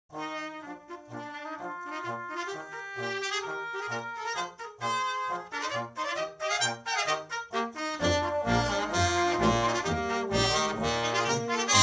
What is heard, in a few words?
brass